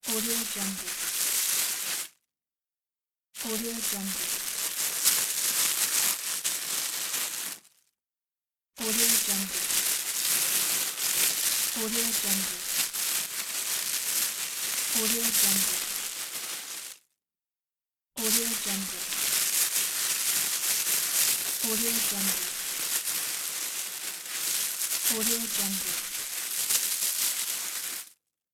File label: the cellophane; blackdragonfx